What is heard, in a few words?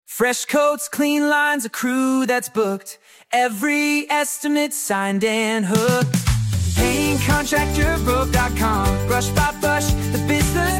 jingle